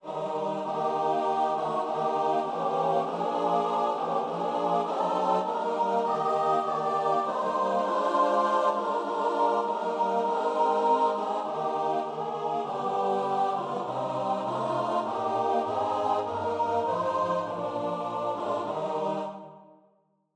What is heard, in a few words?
heard the bells, choir